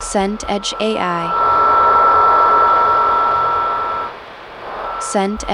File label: amb attic wind